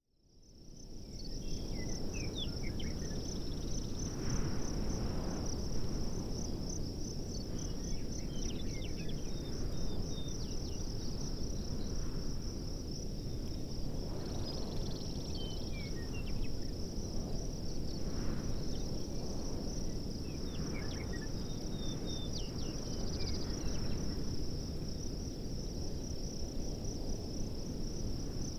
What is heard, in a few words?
Forest Ambience